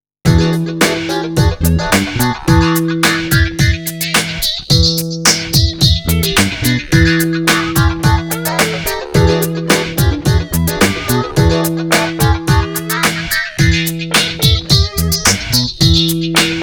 DANCE, Bass Grooves; USB Soundscan vol.30; AKAI

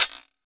Guiro Short, Percussion; Roland BK-5

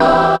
DR. LOOP; X-Static Goldmine 3; CHORDS

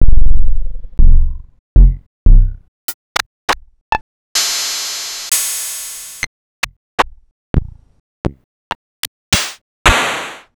Rattlesnake Parametric Percussion System; BME

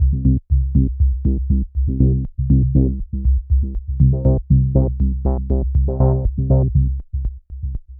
Bassline, Prototechno

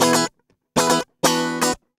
GUI 120 5bE; Best Service Gigapack 2

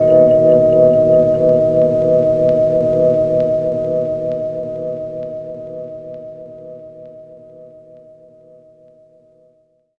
Rhodes Chord; Zero-G Jungle Warfare 1, 2 & 3; 90 s Sample CDs

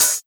Open HH, Cymbals

